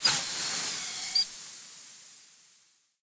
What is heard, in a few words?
Steam; MiSide